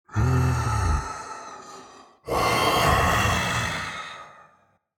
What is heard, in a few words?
Demon king lord breathing